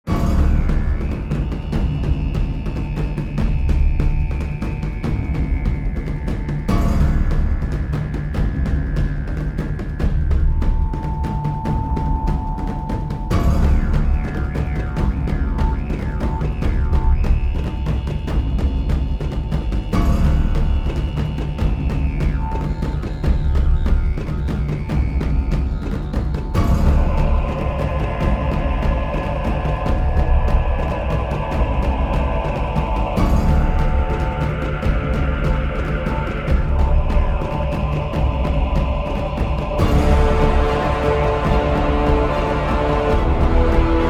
Drumbeat; nehrim at fates edge

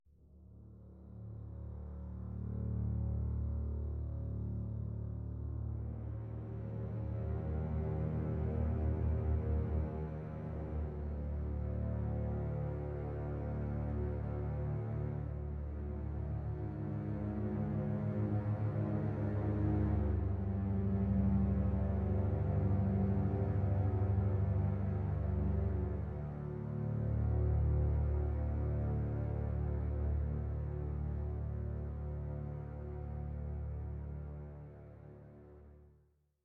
Jungle Rhythm Sneak String; Far Cry

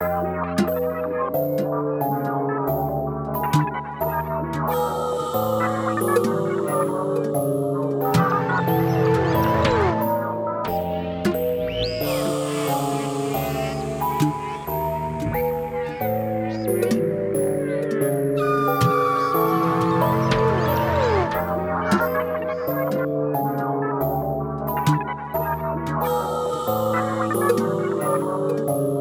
Prebattle - Daunting Opponent; miitopia

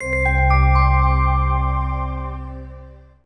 Startup; Go!Messenger; PSP system sounds

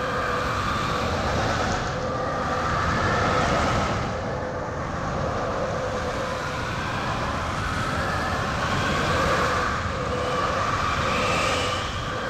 Tornado; Lucius III; pc linux

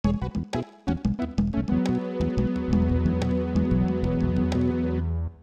Hang Glider Landed Outside Target

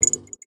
Neon Light On

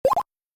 Balloon, Parachute Burst

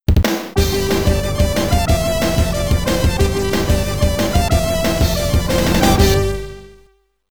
Mission 1 Completed; Operation Thunderbolt; arcade

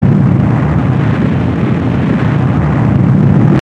Volcano Erupting; Super Mario 63

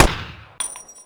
KRISS

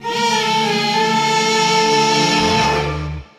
Goal Fanfare; crash bandicoot series sound box; Dead Heat; 4th8th Place

